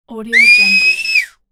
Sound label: excited whistle; sound ideas